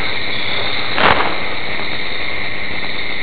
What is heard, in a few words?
E.V.P. Gunshot heard at battlefield